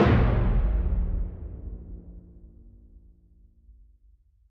golden Attention; excalibur

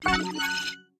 bet Level 2; hotline2

